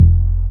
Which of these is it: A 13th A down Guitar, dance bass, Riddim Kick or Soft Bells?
dance bass